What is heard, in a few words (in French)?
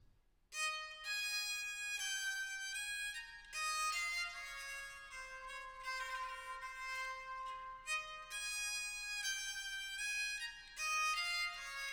Menuet; pour le Bourgeis gentilhomme